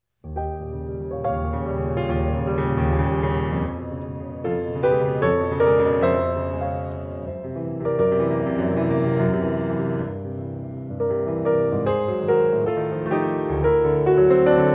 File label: piano; performance today